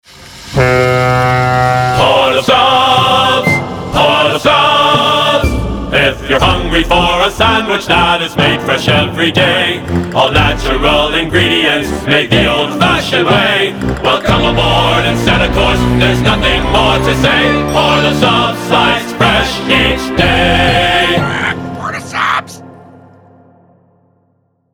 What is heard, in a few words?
Jingle VOCAL; Port of Subs